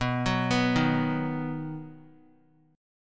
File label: B Major, 3C,3F,3A,3D; arpeggiated; JGuitar